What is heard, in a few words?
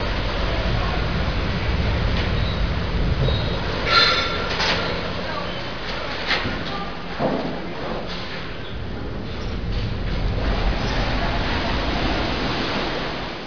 nautical